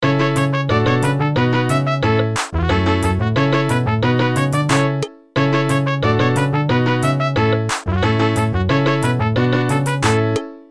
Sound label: bgm minigame